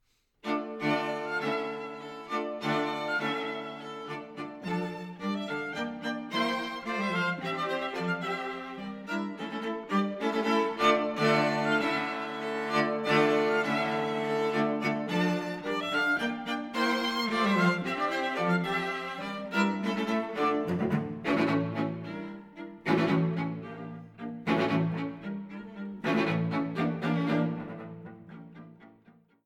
String Quartet in D major, Scherzo. Allegro non tanto e con fuoco